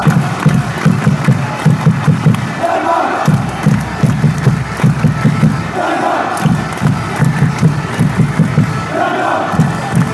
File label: clap